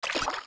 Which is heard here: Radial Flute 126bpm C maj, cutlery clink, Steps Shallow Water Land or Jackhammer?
Steps Shallow Water Land